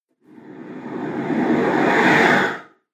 Whoosh Shooting For The Moon

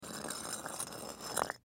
Soap Dispenser, Soap Dispenser Ceramic Slide; Household; Bathroom

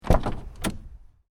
Car: Door Open, Exterior; Cars; Vehicles; Stuart Duffield

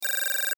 Text Readout Digital; Multimedia; Blastwave

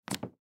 Cork Board Remove Push Pin; Office; Blastwave

